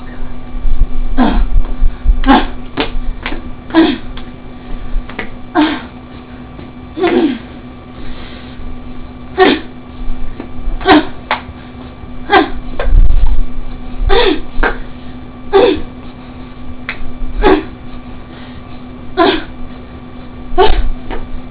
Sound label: orgasm